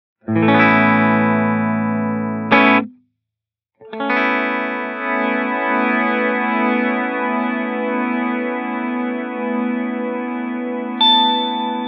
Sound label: Ambient Triggered Multi Swell